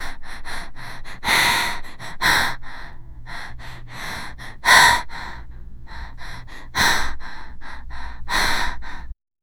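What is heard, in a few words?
a girl anxiously breathing, worry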